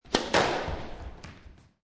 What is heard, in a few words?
Sports Squash Serve To Wall